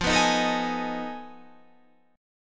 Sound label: F 7th Sharp 9th Flat 5th F down; F7#9b5; strummed